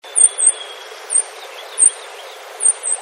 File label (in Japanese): hojiro